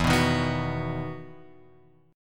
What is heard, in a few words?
E Major 7th Suspended 2nd; EM7sus2 Chord {0 2 1 X 0 2} strummed